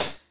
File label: hihat loud